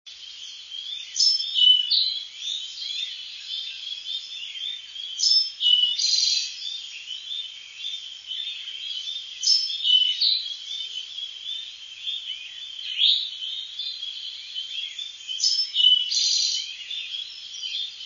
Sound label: towhee